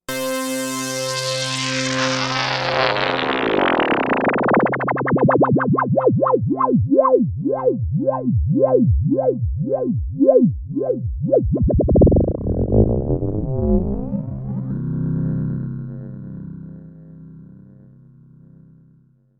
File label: Sizzler